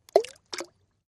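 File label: Splash Sml Pebble Plop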